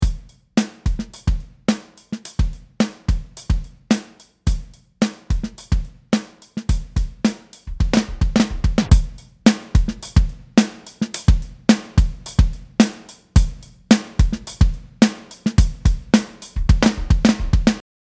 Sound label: Drumbus; Supercharger; Effects